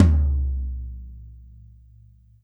16 hi, Drums&Percussion; E-MU Producer Series Vol. 7 – Old World Instruments

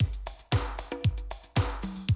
Classic Drum Loops

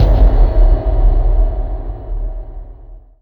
hit, IMPACTS & HITS; Techno Trance Essentials

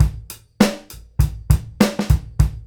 GROOVE; E-MU Producer Series Vol. 8 – Platinum Phatt

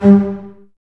Basses G 3, String Ensembles; Miroslav Vitous; LINKS ORCH

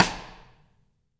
STEREO AMB SNARE RIM

